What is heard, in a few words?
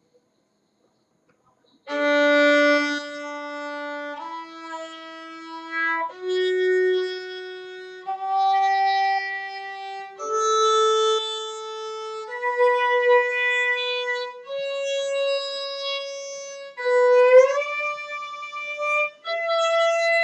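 D major 2 octaves Open, violin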